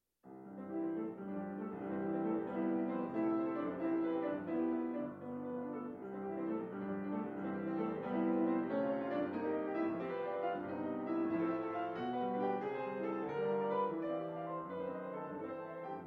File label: Piano